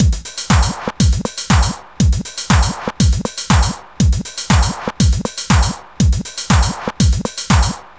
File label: 120 trance beat, Drum & Perc Loops; Trance Explosion Vol1